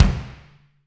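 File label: bass drum, Roland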